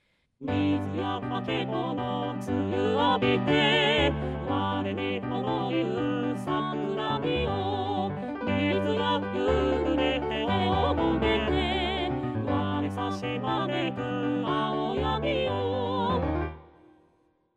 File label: Duet